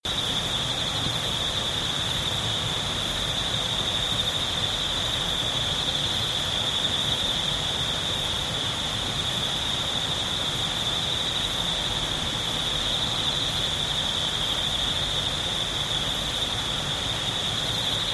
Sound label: fall river at night